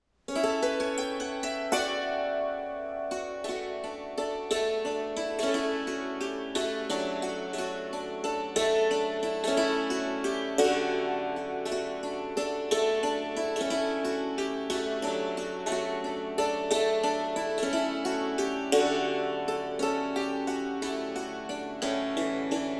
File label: Waltz